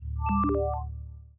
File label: email button